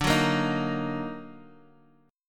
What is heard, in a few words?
Eb Minor D; Ebm/D strummed; JGuitar